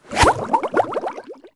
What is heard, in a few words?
Bubble Wand; BFBB